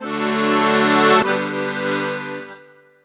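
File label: Accordion 098bpm G end, African; Quazibeat - African Secret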